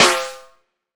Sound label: SD HIP; Best Service; Dance Mega Drums